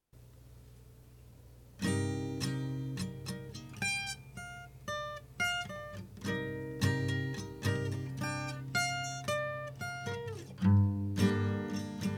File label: Rainbow Bells of Nature